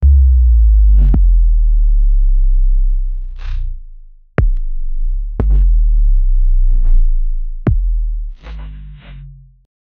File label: movie trailer bass drop